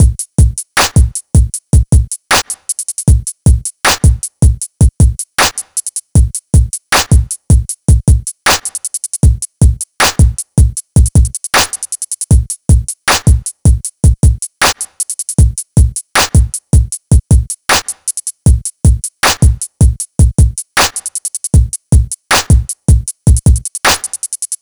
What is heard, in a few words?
drums